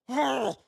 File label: AHurt